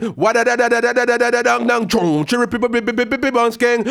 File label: ragga in B phrase